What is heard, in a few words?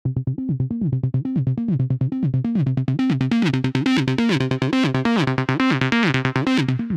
Seq Trance Serum